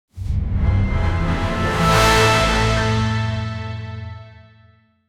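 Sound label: riser cinematic action epic; sound effect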